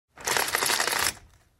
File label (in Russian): zvuk zhaliuzi